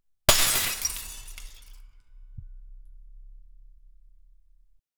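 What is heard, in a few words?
heavy ceramic statue toppling, crash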